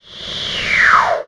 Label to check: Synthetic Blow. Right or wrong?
Right